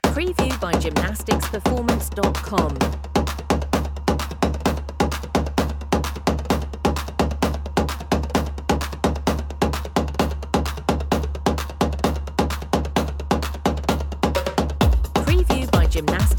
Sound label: egyptian tribal